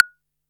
DR-660 Perc, Drums, Boss DR-660

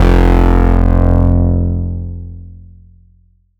Bass, 2600 F1, Synths, ARP 2600

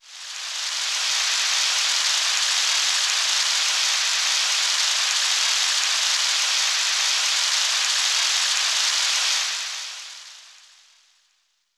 Rain; Roland BK-5

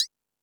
FX Sounds, Drum Machines

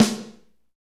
SNR, Roland; Northstar - Drumscapes Roland

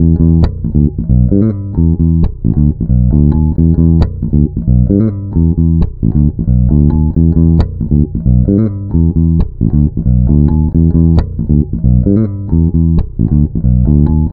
Bass, Electro Lounge; Sonic Foundry (Sony Creative Software); 134 Six On Nine

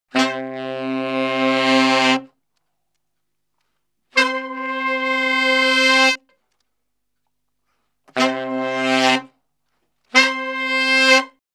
Salsa Picante; Masterbits; World Zone VOL-3

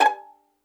G#5 PIZZ, Violin; Q Up Arts; Denny Jaeger Master Violin Library; PERFORMANCE

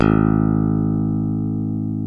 ELECT. BASSES; OMI - Universe of Sounds

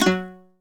HALAM PICKS; Best Service; World Colours

